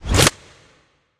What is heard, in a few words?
space tile pickup